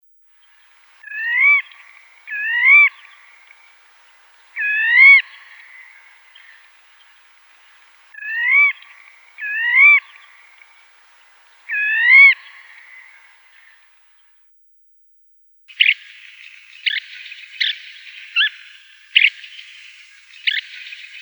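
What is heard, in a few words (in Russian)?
Pesochnik dlinnopalyj, long-toed stint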